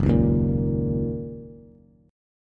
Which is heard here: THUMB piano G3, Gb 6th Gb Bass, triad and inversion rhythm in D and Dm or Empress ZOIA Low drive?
Gb 6th Gb Bass